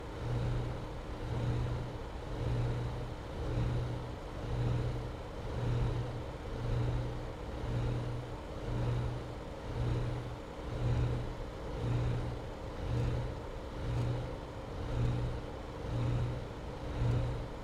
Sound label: Ventilation Fan, small box, metal, electric motor, disconnected, whirring, spinning, low intensity; surges; loop; Eiravaein Works; Vaeyan IV